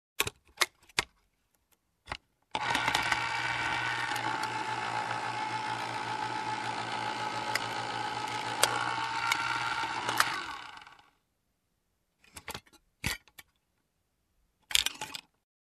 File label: Soda machine pouring